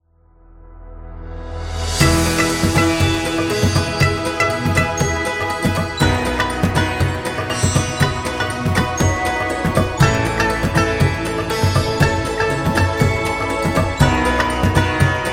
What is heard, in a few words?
Inspiring India